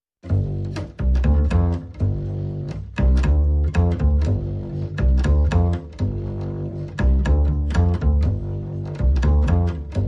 Bass Only

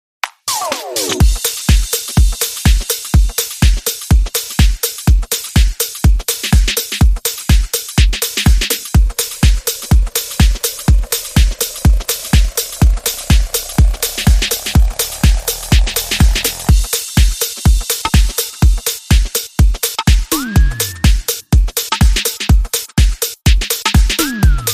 Beat

